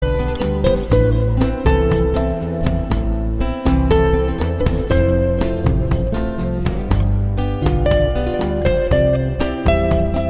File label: Rumba On The Beach